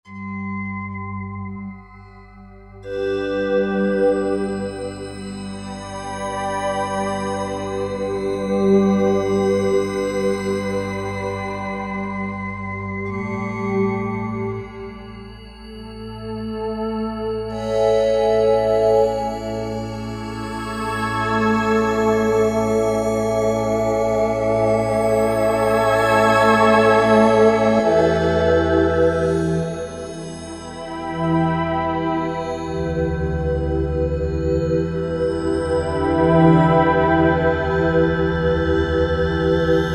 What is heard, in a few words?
Hearts of Space, Roland JD-990; Factory Patches